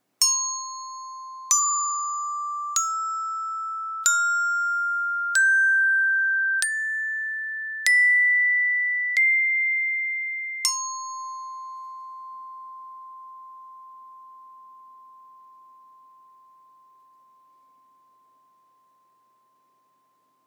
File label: Wooden Club